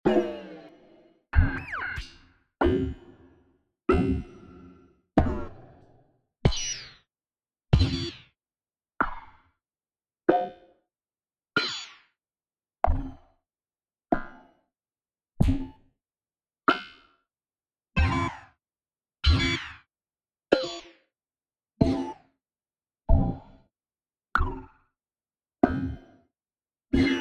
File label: UI sounds sci-fi